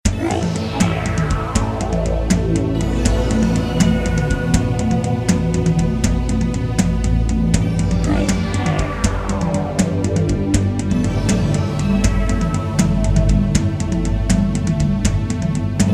Music Bongo Elektro; sturmfront the mutant war ubel edition

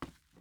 wood step; teardown; ps5 windows xbox series xs

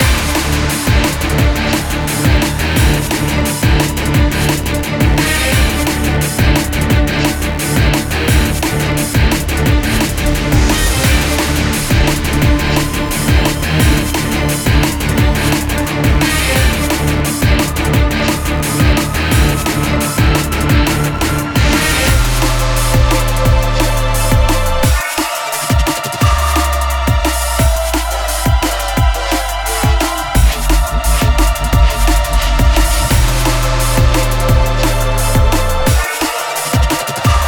Loop; Lost Epic

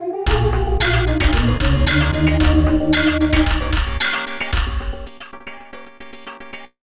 Player Down; Donkey Kong Country 2; SNES; Mining Melancholy

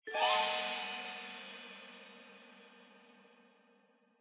Suspicious One Shot

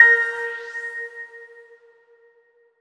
Cmin - collision; Color Zen Kids; 3DS, Android, iOS, mobile, Switch, Wii U

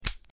Open Padlock

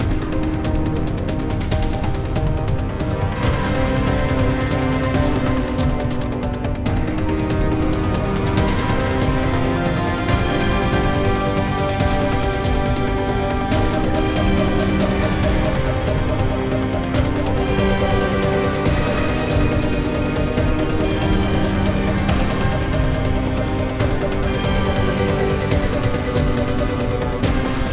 Marauder Turn

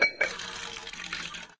Sfx - Well

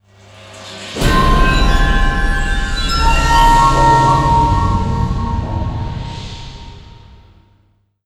Mission Complete; fatal frame 20th anniversary celebration soundtrack

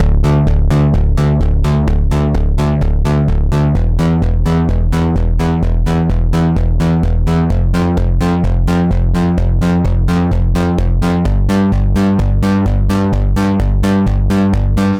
Poly Bass; super crush k.o.

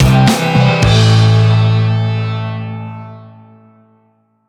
victory; Rescue 2013 Everyday Heroes

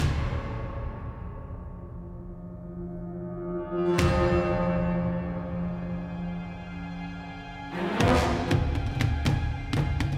Spooky Ship Loop alt start

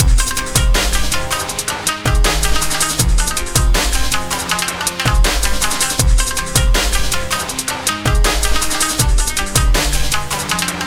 panic

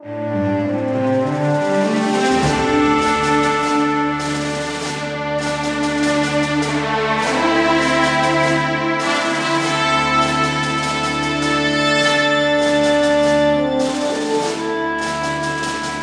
Troop Camp Cinematic

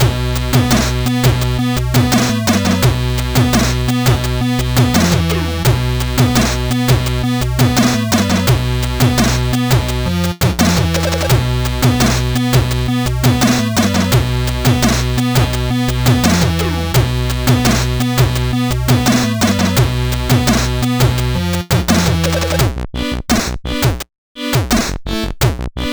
Mechanical ChipTunes

